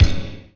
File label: Big Room Hit